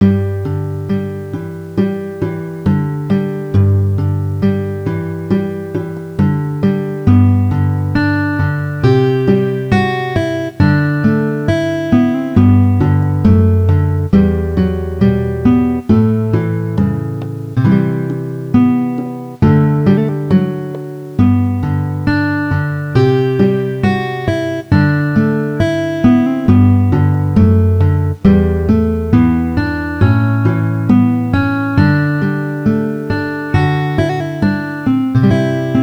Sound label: bgm hotel; HL1-Mecklenburg